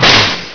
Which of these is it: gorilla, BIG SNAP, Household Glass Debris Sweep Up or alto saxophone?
BIG SNAP